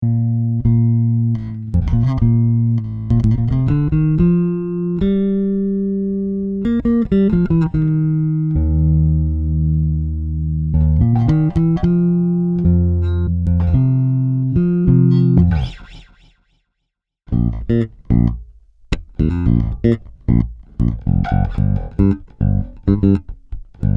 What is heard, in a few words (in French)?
Groove Actif Tone 100, basses, Sadowsky NYC 5; Sadowsky MV5 70 NYC